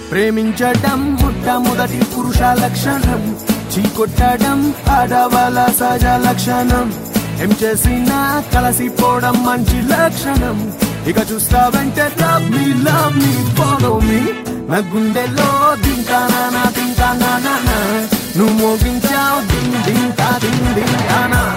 Telugu Ringtone

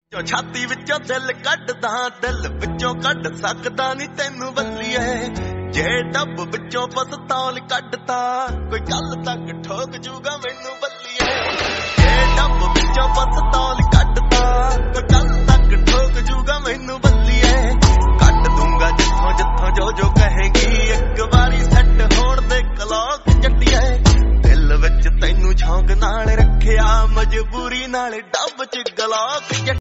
Punjabi Ringtone

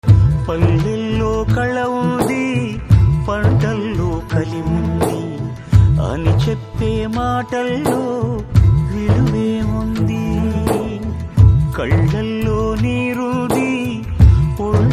Telugu Ringtone; Yatra

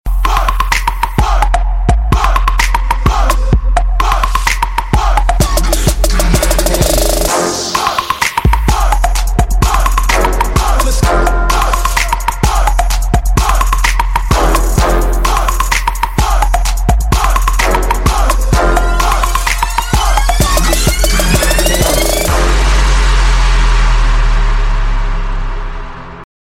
Clubbing Ringtone

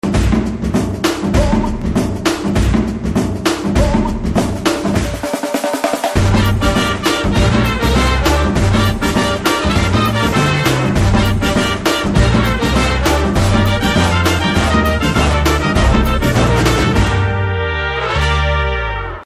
BGM Theme Telugu Ringtone

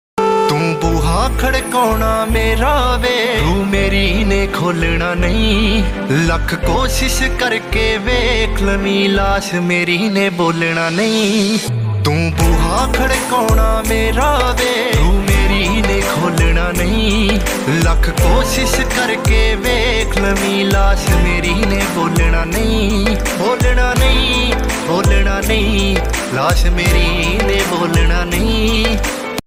Desi Punjabi Ringtone

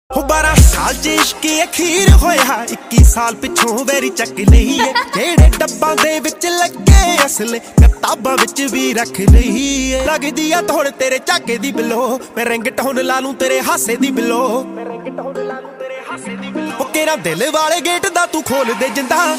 Dance Punjabi Ringtone

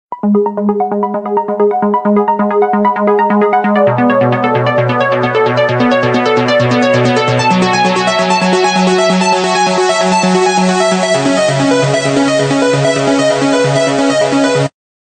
Cool Rhythm Ringtone

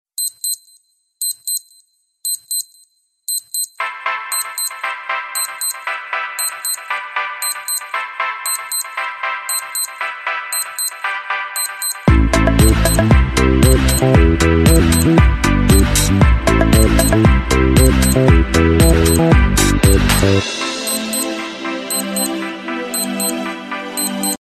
Beep Music Ringtone

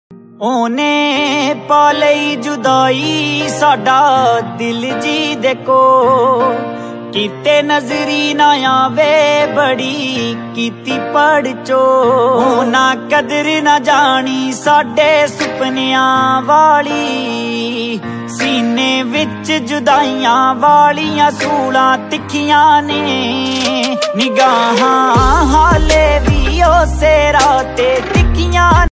Punjabi Ringtone

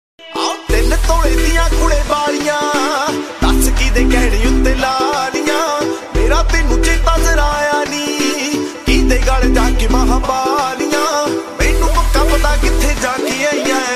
DJ Punjabi Ringtone

